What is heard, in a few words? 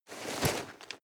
nylon leather medium short